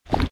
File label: bird poo